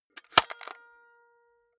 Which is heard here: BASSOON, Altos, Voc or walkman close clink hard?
walkman close clink hard